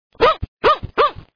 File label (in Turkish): köpek, hayvanlar